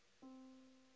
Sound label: Yamaha Disk, piano sound